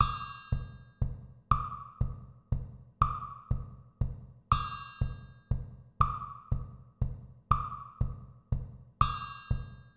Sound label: deep triple metre dots; organisation of time metre